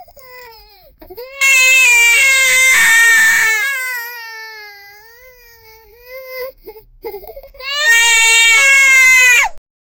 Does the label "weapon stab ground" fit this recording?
No